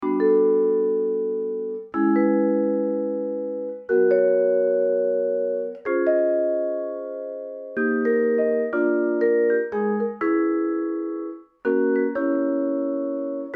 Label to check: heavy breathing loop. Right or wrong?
Wrong